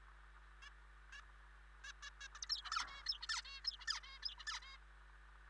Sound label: Control birds at ad